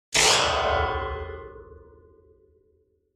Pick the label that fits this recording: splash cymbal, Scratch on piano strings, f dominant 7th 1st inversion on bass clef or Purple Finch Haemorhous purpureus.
Scratch on piano strings